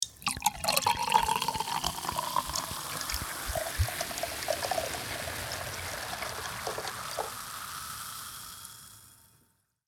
Pouring sparkling water fizz drink; Liquid

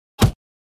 Punch face

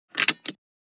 Airplane interior passenger fasten seatbelt; vehicle

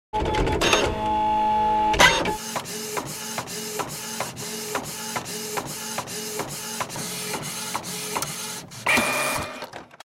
Epson inkjet printer printing a page

